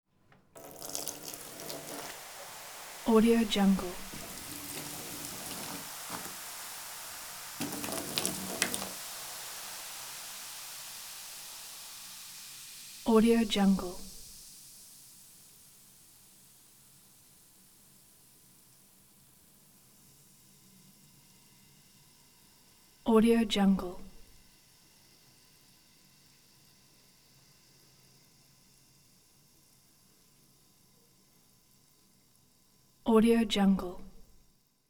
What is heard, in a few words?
acid sizzle; prosoundfx